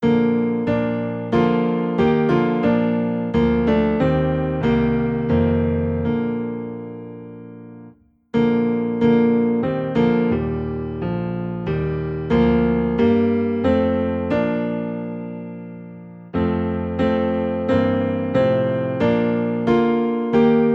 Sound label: Be Thou My Vision, Piano Low in B Flat